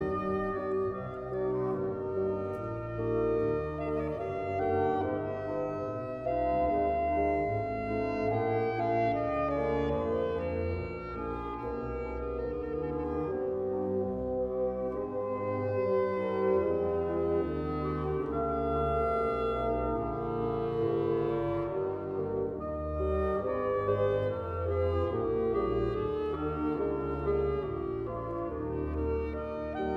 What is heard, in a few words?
Adagio Serenade; Mozart